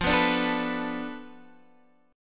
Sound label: B Minor 7th Flat 5th F, guitar chord